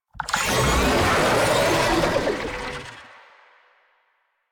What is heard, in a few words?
Infested Oni Vocal Idle; Warframe